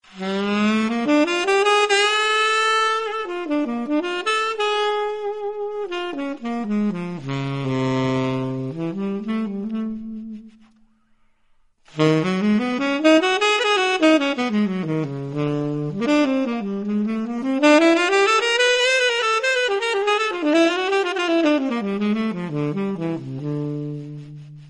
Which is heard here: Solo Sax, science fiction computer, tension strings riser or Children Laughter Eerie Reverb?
Solo Sax